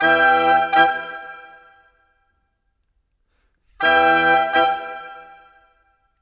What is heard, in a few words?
Winds, Orchestral, Rock Pop; Sonic Foundry (Sony Creative Software); Orchestral Series VOL-4; Rock and Pop; Rock-Pop 19